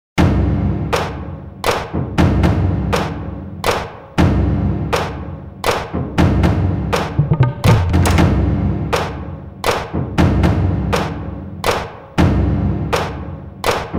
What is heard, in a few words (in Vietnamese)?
Nhạc nền nhịp trống hoành tráng cho video